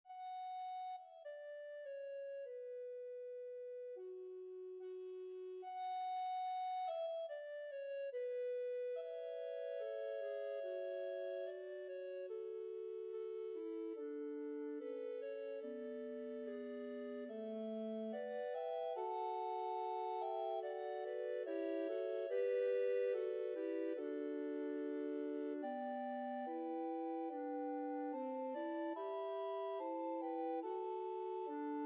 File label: Bamboo carol